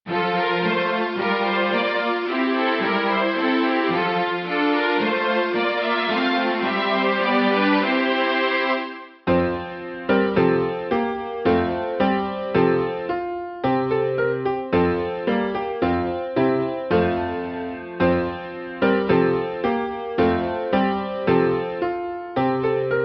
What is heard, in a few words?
Piano; Deck the halis